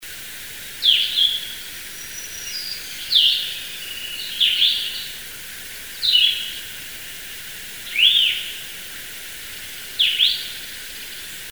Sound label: Blue Headed Vireo